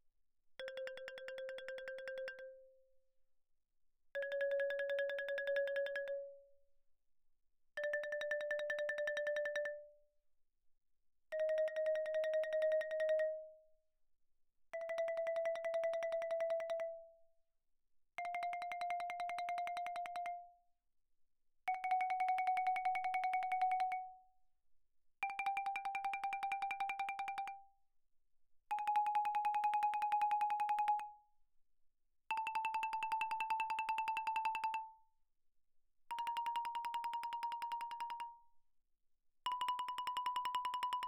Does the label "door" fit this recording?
No